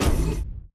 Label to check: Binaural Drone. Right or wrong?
Wrong